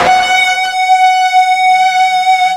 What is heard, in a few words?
FUZZ F#4, Guitar; East West; Steve Stevens Guitar Samples Collection